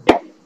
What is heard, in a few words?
baseball bat; hedgewars